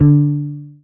marimba guitar; ゆめ2っき

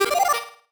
marimba dice; ゆめ2っき

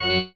marimba grave; ゆめ2っき

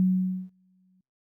piano; ゆめ2っき

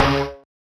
marimba steeldrum; ゆめ2っき

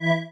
marimba spacesuit; ゆめ2っき

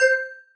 marimba; ゆめ2っき

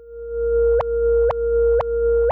spooky zoom

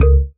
marimba raincoat; ゆめ2っき

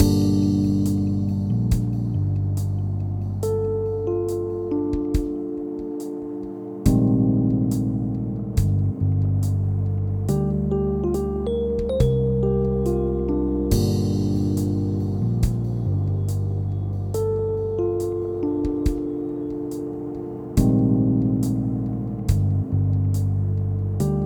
music static; ゆめ2っき

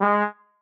marimba trombone; ゆめ2っき

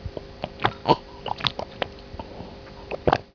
Drink

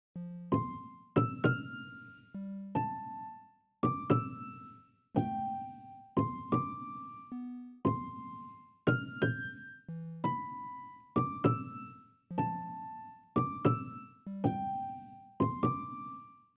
BGM, Music; ゆめ2っき

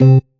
marimba; ゆめ2っき

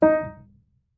marimba piano; ゆめ2っき

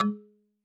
marimba kalimba

